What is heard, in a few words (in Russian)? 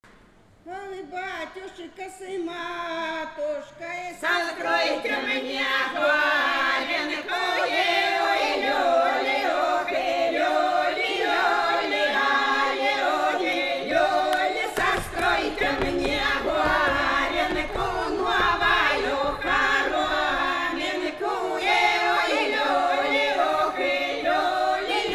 плясовая, Фольклорный ансамбль; Фольклорный ансамбль с. Глуховка; Ой батюшка с матушкой состройте мне горенку